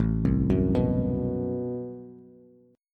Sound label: A Minor 6th Add 9th A Bass; Am6add9 arpeggiated; JGuitar